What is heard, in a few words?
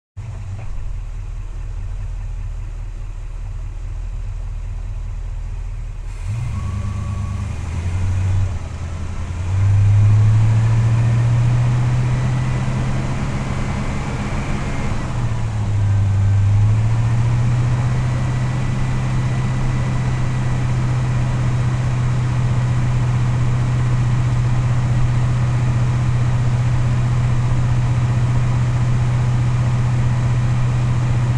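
pontiac gto onboard drive steady RPMs stop Engine; Pontiac GTO 1967; Pole Position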